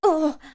Ledge Fall; Link falling off a ledge

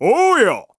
Ohh Yah; Gondo: "Ohh-yah!"; Skyward Sword

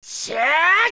Spin Attack; Link

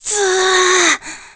Victory Moan; SSBB; Toon Link

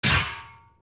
sword rock; OOT

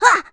Crash; Link rolling into a wall (These sounds only play very briefly before the "Crash Recover" sound)

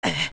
Ledge Fall; Adult Link; Link falling and grabbing a ledge

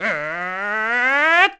Errr; Niko; "Errr... T'ahh!"; Zelda

